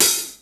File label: Hat Middle, Cymbals; Akai XR-10